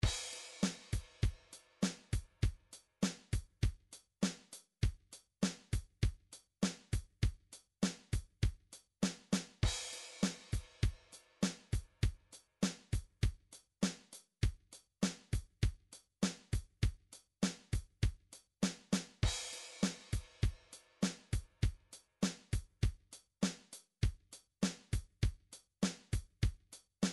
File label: drums rock, midi drums